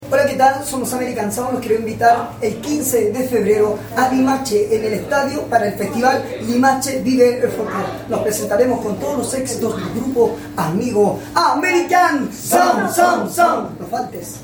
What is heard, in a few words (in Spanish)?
vocalista; festival limache vive folclore